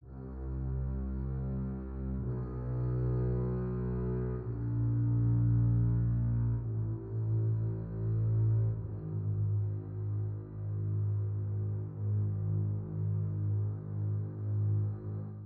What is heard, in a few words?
Low Falling Tones C to G#, String Ensemble